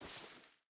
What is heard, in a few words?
footstep boots grass walk; Rust